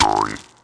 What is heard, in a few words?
Crunch